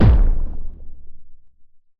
sound design thud boom with slight squelch; dustyroom

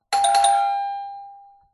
doorbell; la paz; your free sounds

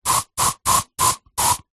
aerosol insect repellent several short bursts

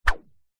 cartoon whoosh whip away

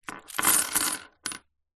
foley coins handful down on wood surface

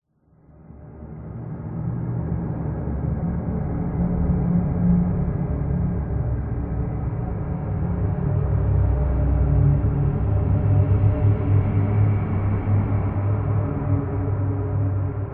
horror swell dark sinister empty

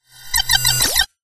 science fiction robot small beep process chirp

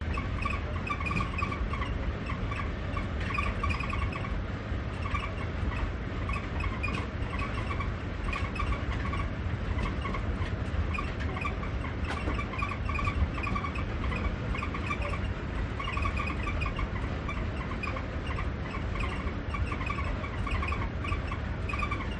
squeaky rattling train ride loop; the sound pack tree

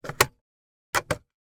van ashtray open and close iveco; transportation; sound effects; sound effects one

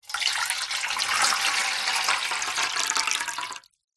household water pour medium into metal tin tray; zapsplat

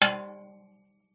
waterphone bottom hits wooden mallet dynamic; aston stealth

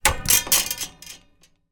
metal airer lean against wall

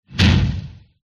warfare mortar projectile launch

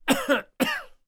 human coughing male 60 years old

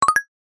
multimedia ui notification alert short glassy

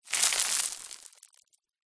stones small debris fall down pile; foley

